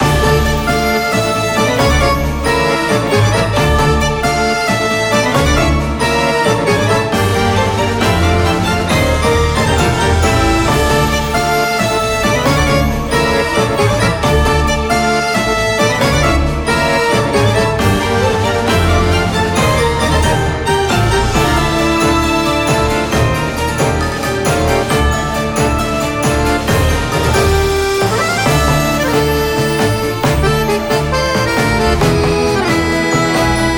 Bygone Era loop, BGM

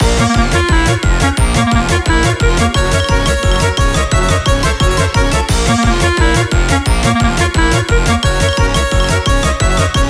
Clown Circus loop, BGM

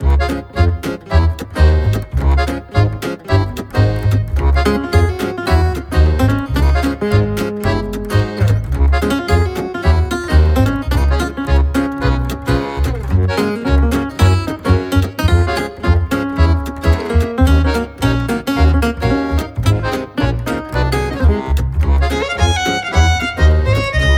Cafe Seaside loop, BGM

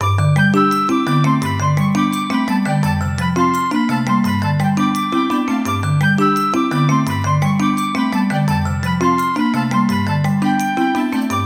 Lollipop Lane loop, BGM

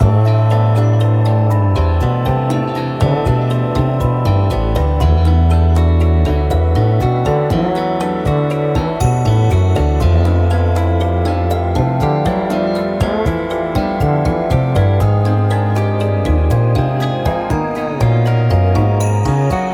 Mystic Tides loop, BGM

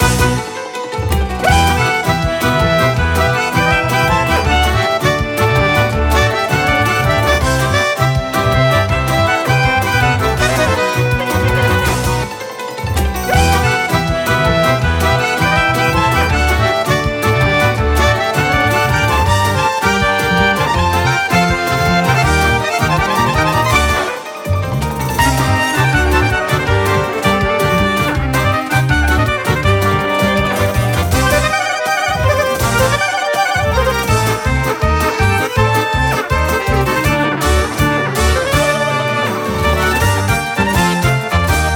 Folk loop; Folk Roma2

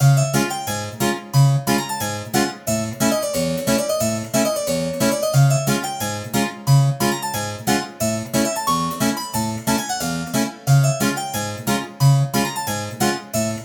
Puppet loop, BGM

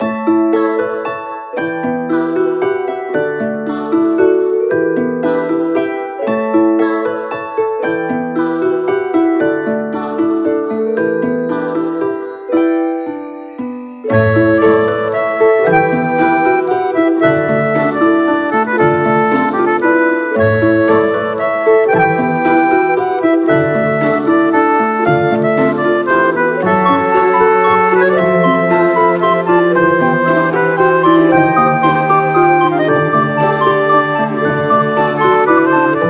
Poema loop, BGM

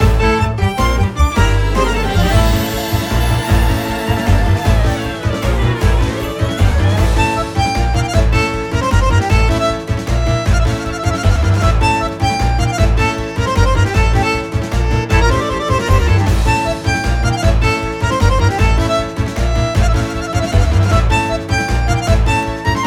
Battle loop; RetroRoman

